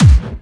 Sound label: BD Clubby; VEC1